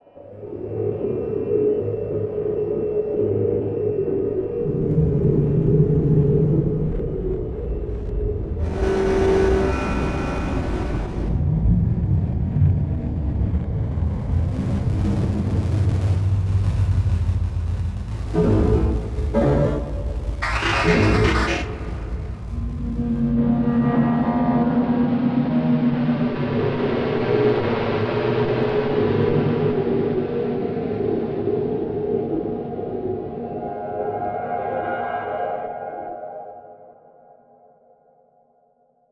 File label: Echo Ambient Beeps; Module Production Element Title Imaging